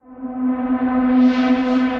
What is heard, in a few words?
Clouds Drone, synth pad; Ableton; Glitch and Wash